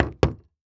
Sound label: Foley Footstep Golf Cleats Jump On Hollow Wood Surface; Foley Footsteps